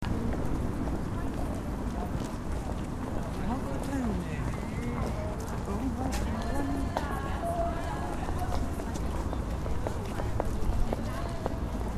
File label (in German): fussgaengerzone geraeusche; salamisound